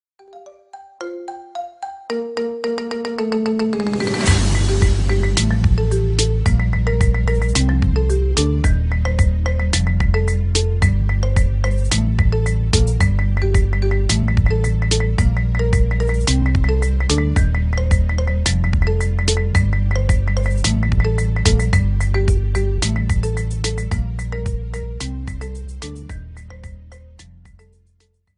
bounce marimba ringtone remix

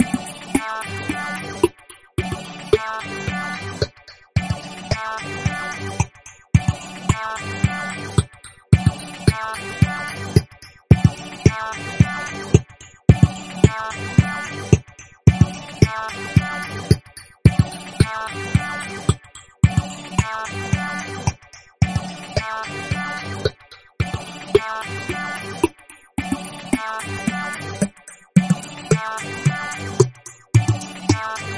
disco ringtone

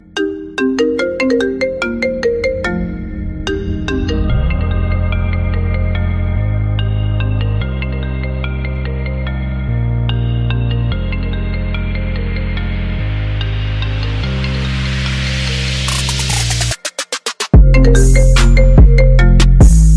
cool hip hop marimba remix

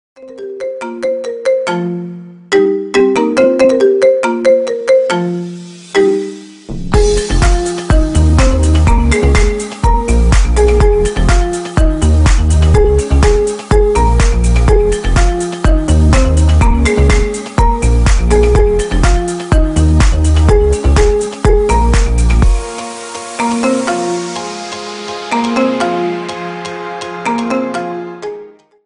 marimba ringtone remix

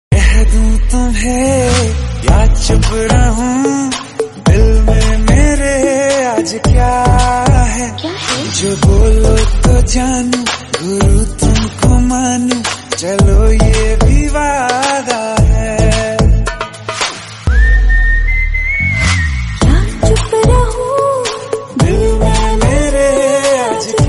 romantic song

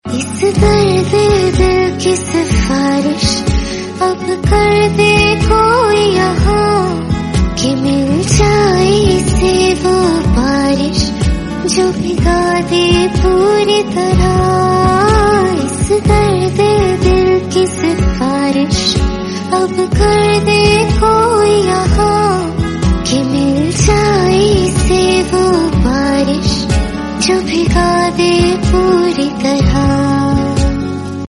female virsion ringtone

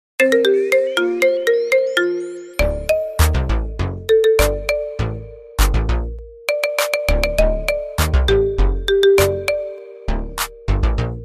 right hand marimba

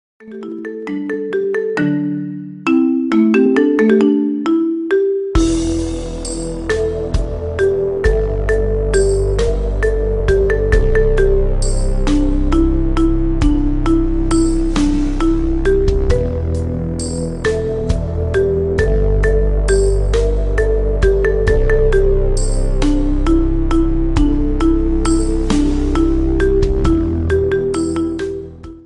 marimba ringtone remix

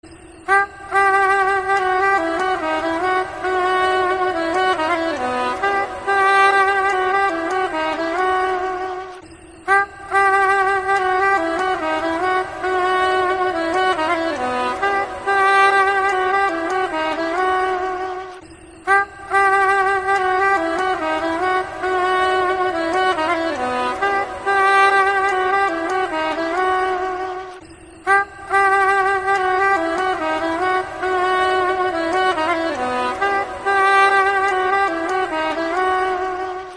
heart touching malayalam ringtone

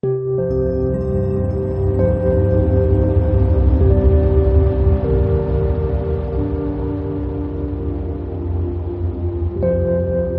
romantic

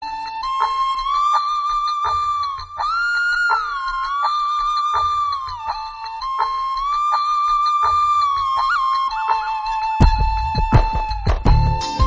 flute

